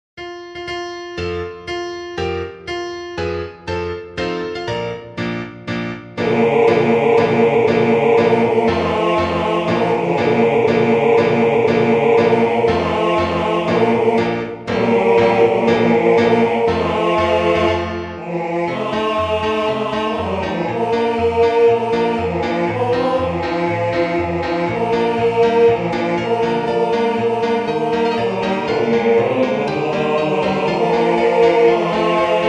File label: bass; with heart and with voice